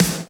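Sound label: electronic snare drum; Best Service Hyper Dance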